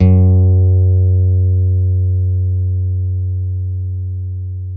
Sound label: STEEL, Guitars